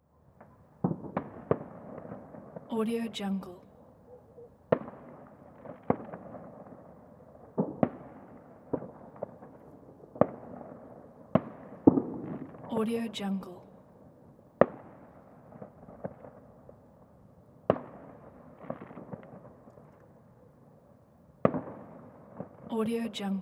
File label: fireworks large distance; mountainaudio